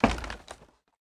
ladder, step; blocks

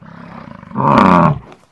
bison; ambient

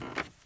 squeaky wood wander, step, bamboo; blocks

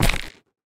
step, stem; Minecraft

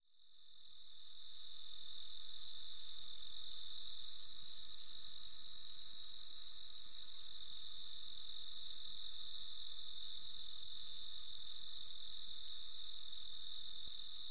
cricket night; ambient

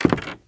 squeaky wood walk, step, bamboo; blocks

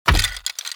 hurt, skeleton

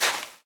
sand walk, step; blocks; Minecraft; Remastered

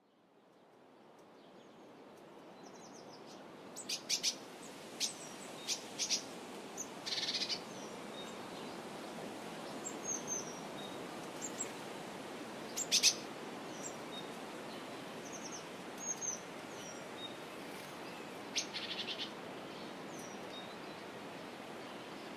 bird wind, ambient

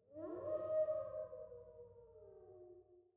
wolf

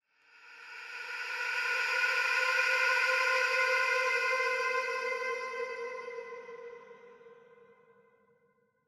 cave, ambient; minecraft

